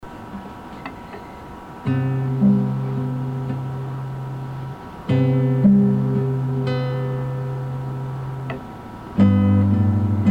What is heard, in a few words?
Guitar